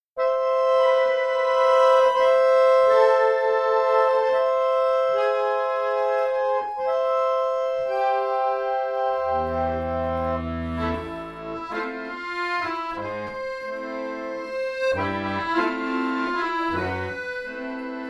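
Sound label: Accordion Duo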